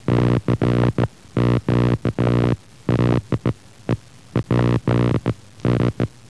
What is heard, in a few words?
spark